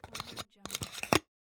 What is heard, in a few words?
plastic vitamin tablet container open